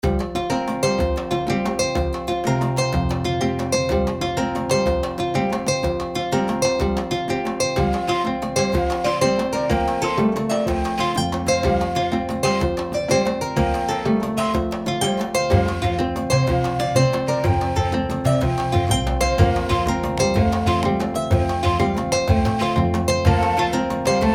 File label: West Africa Mix